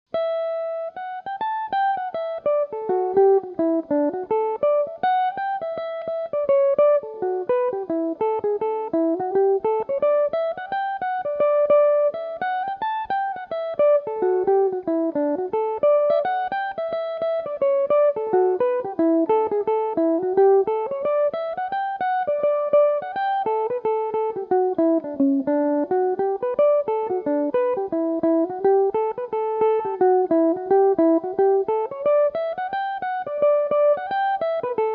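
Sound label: jig, D major, mandolin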